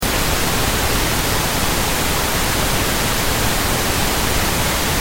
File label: pink noise